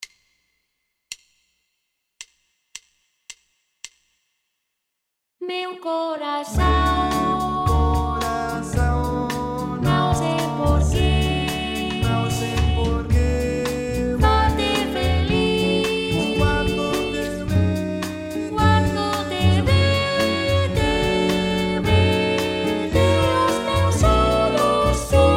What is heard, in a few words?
SATB